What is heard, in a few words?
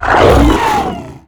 jump; Quake 4 Alpha; id software; strogg; tik